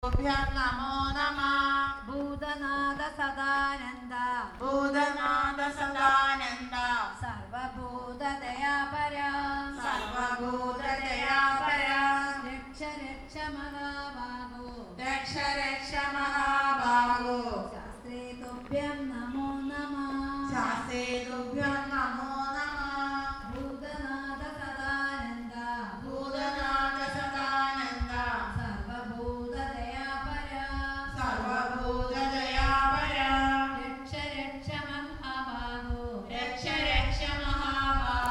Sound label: hindu chants, South India street recordings